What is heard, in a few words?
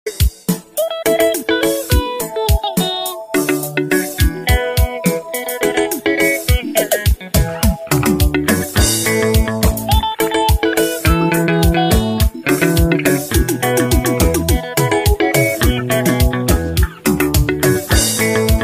Xitsonga